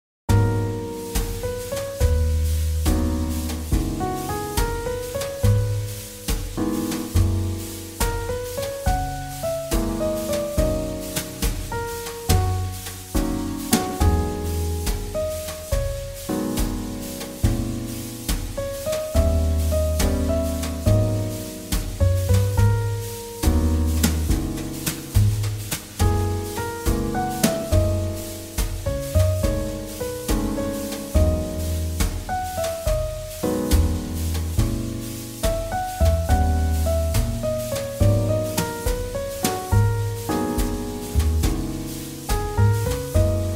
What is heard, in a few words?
JazzBallad; 잔잔